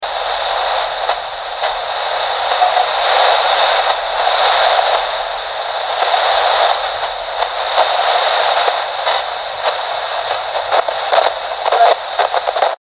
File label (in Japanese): 通信不良